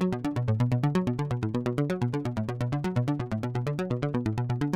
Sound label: arp, synth